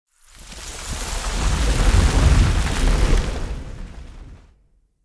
Root Door Close B; Neathuns

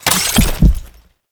Sniper Aim In; Warframe; Frumentarius; Exalted Sniper

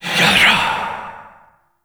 Grimoire Fire Whispers; Warframe; Tenno

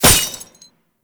Tank Weakpoint Impact; Enemies; Gameplay; Lasrian Tank; Warframe

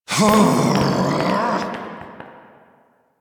Storms Out; Warframe; Entrati Labs; Cavia Rank Up Scenes; Tagfer

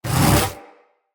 Cast; Warframes; Excalibur Umbra

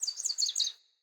Sparrow Song; Animals; Vocalizations

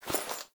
Body Move; Frumentarius; Warframe; Ambience; Nineteen Ninety Nine

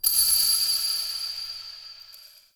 Interior Orbital Station Ring; Warframe; Tau

